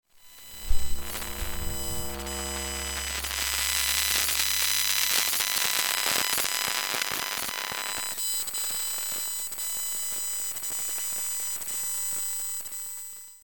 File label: Laptop glitches and noise